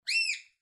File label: cockatiel